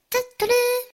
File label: tut tut lovely message tone ringtone, sms ringtones